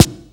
SN, S.DRUM, House Side Of 2 Step; USB Soundscan vol.51; AKAI

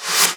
Reverse FX

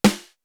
R8 PERC; Greytsounds VOL-1

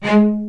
Spicato Basses & Celli

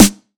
909 Snr Sweet, Tape 909, SD; Goldbaby Tape808 & Tape909; The Tape 909

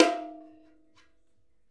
Bongo High With Stick; One Shots; Essential Percussion

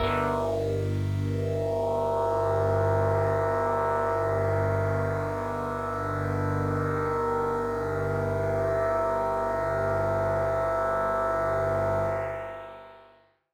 One Shot Drone G, dystopian drone; One-Shot Drones

